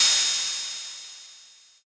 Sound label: crash cymbal